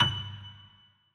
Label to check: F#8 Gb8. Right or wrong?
Right